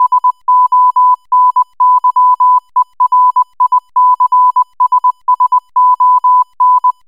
Morse Code

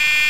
Alarm; Game and Watch

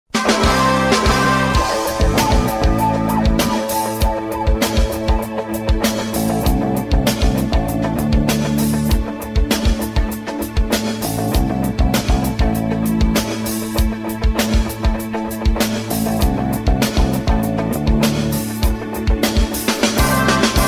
Bass

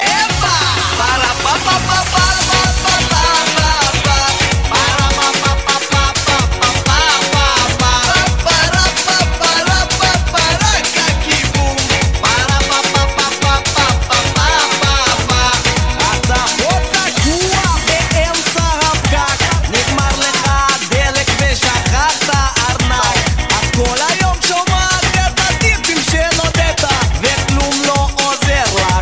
Cute Song

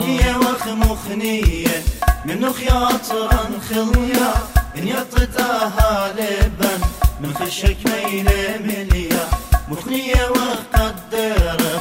Assyrian Song

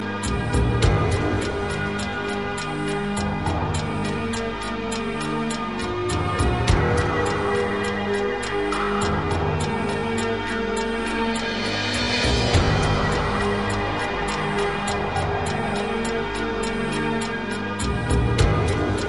Dramatical Clock

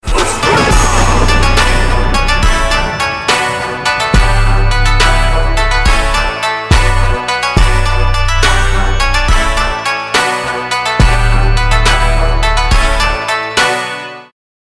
trap

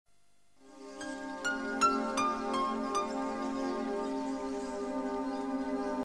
Melody

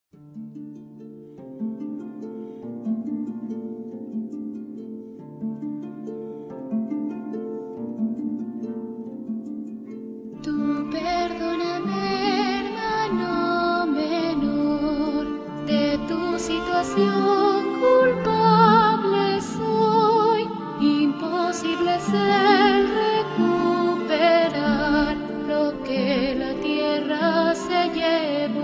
Latino; Fma

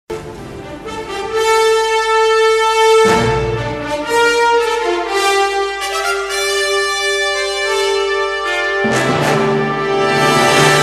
Fight Bgm; Chitti

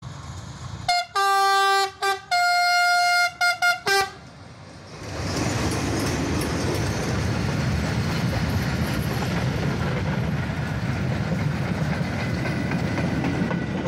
Alstom horn; FGC

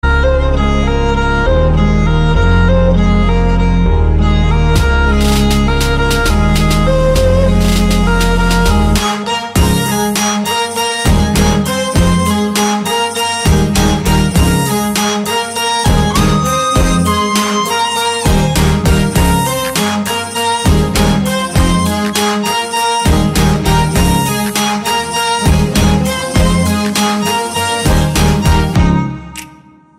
violin

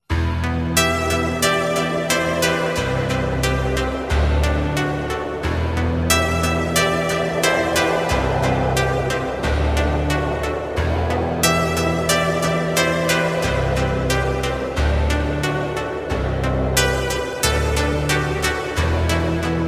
Music

